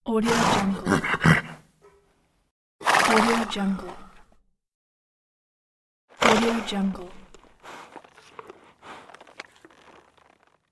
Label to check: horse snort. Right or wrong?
Right